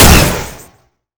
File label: ar2 fire; hl2dm